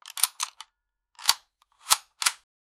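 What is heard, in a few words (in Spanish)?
recarga m4a1 para videojuego, firearm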